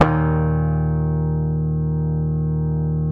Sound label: D#, Bass; Sampleheads; Will Lee Bass Library